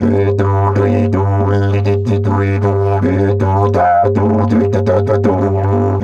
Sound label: Didge 80 BPM, Didgeridoo; Big Fish Audio - Didgeridoo and Other Primitive Instruments